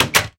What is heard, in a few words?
door close, minecraft